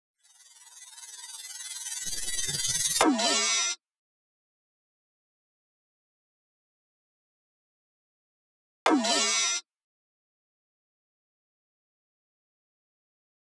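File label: piano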